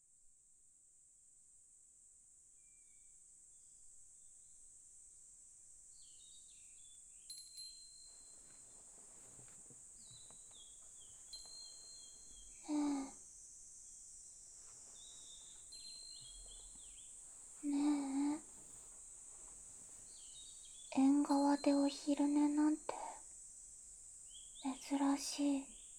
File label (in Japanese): 耳マッサージしとく; 効果音同時収録